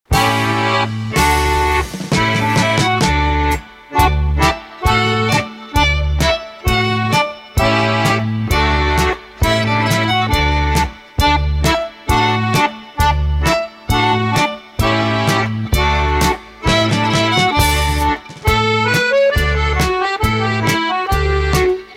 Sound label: danish dance of greeting (instrumental)